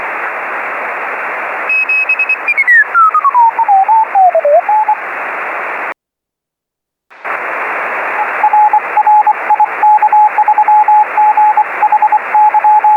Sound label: 2320 CW; IK7UXW